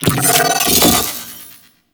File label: Zanuka Companion Magnetic Repulse Cast; Warframe; Characters; Abilities